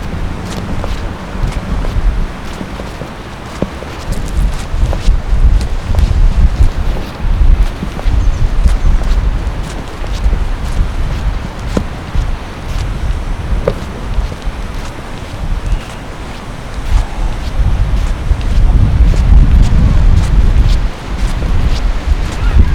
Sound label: Park Walk, Outside